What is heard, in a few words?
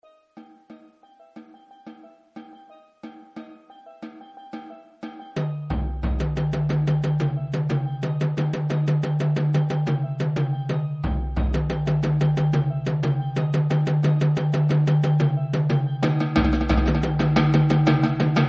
south american pattern, drums